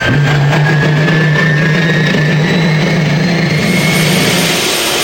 Jet Engine Start

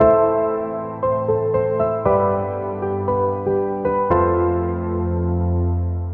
Grand Piano Solo

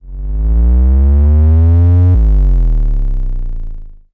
Moog Bass Loop

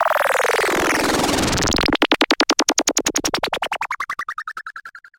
Moog Synth

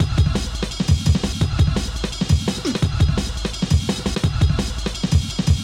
Nasty Dnb 170

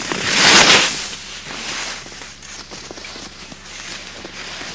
Ice Skating Sound

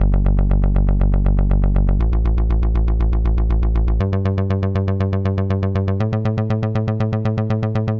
Plucked Analog Bass

